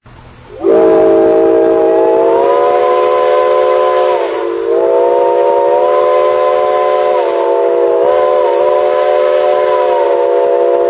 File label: steam whistles